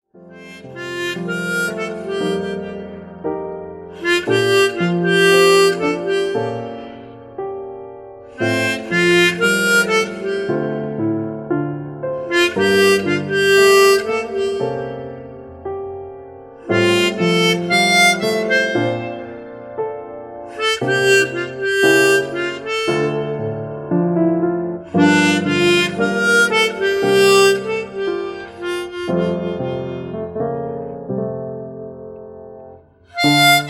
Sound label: sakura harp